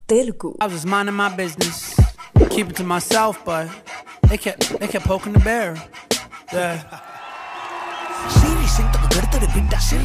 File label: Rap; Dasara